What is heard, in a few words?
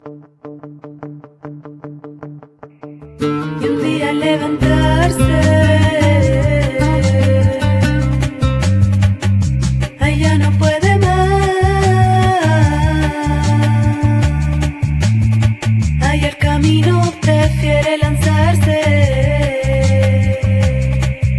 Flamenco Chill